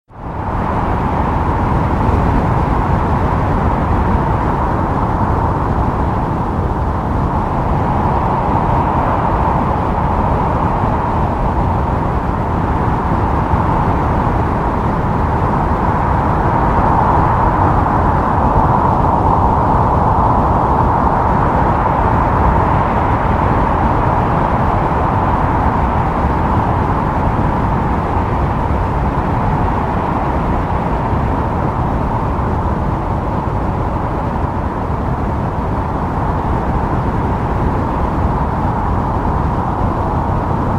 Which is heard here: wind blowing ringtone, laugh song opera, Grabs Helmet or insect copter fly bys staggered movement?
wind blowing ringtone